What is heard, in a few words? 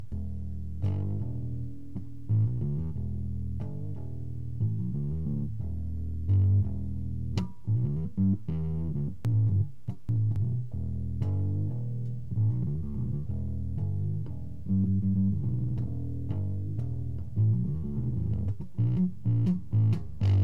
bass